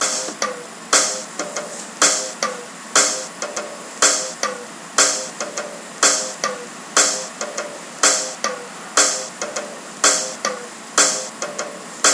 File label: beat norm